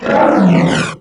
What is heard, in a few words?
sight, doom3 pinky